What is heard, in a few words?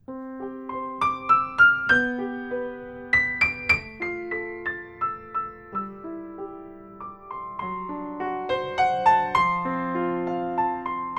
piano